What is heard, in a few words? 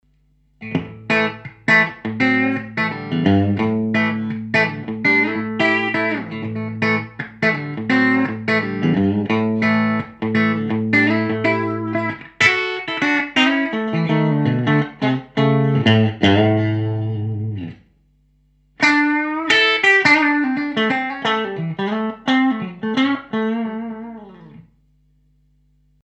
clean bridge; schwarzbach